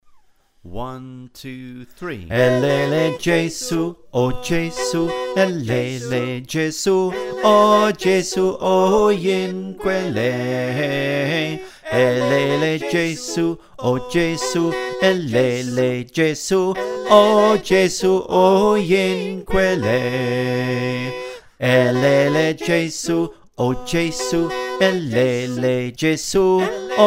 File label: Bass, choir